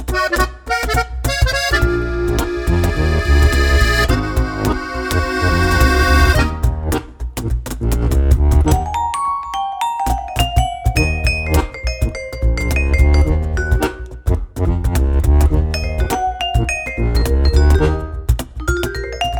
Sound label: Blues